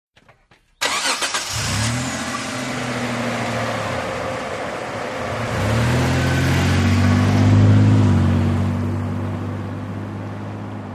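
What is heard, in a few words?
Montero Start Away Med, 1998 mitsubishi montero ext.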